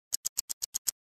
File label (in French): Bruit